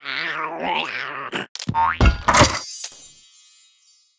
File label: crab VO; reef raider